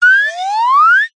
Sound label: cartoon slide whistle ascend climb fast